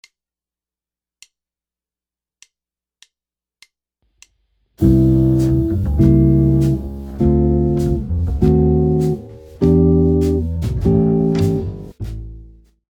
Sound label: jazz chord progression, guitar